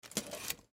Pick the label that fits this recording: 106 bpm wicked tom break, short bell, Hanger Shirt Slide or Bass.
Hanger Shirt Slide